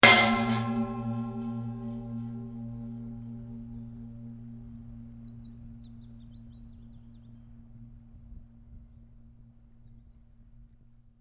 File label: Metal Impact Hit Gong Like With Long Ring; Resonating metal pipe impact, long tail; Metal Sound Effects; Lynne Music